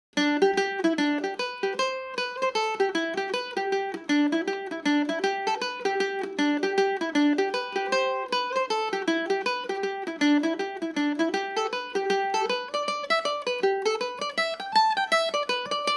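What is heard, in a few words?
reel G major, Irish mandolin; The Piper's Lord McDonald; G&O #34 mandolin; Reel (4/4)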